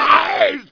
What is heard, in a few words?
zombie death; zombierpg